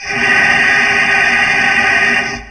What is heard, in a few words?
Air High, Train; Mk48; OpenBVE; Szemely